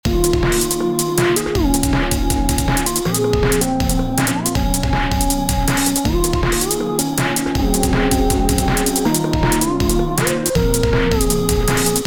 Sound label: Ambient Break; Nate Caughron